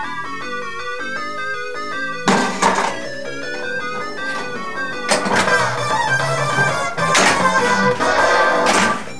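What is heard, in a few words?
Mega menu mode started, pinball; Creature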